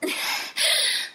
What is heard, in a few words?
moan; Titanfall2; R2Titanfall; Goofy ahh sounds; lstar ventcooldown